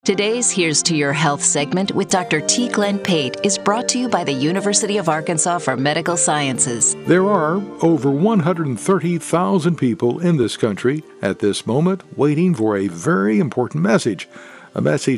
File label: organ